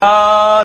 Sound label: "ah"